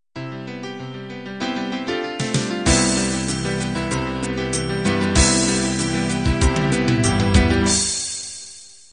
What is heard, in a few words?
folk ballad intro